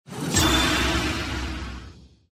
mega win

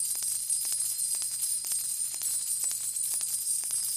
mega win coins

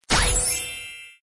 winning line

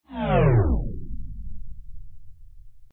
check unluck

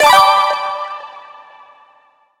Win

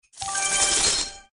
get Coins

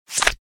Open Card

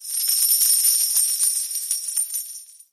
prize little

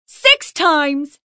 multiple 5

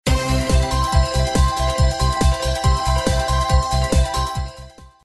Music Total Mega Win

